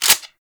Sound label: Shotgun Pump Mech, Weapons; Warframe; Corpus